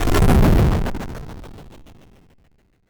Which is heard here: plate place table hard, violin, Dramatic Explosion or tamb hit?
Dramatic Explosion